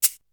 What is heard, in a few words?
Shake, Drums; ZERO G - Datafile DISC 3